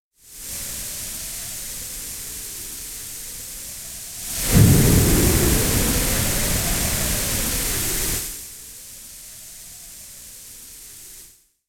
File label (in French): vent fou phaser